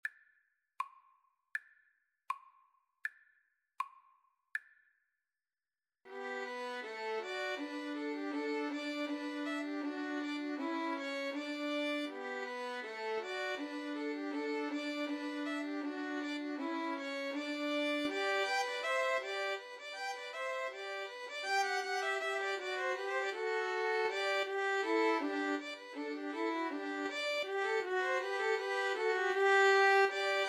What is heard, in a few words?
blacksmith, 2 Violins Cello; Slow Playalong; Handel, George Frideric - Harmonius Blacksmith